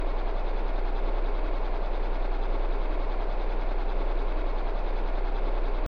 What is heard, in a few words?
table fan; Lucius III